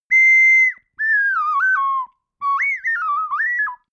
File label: ocarina random fraction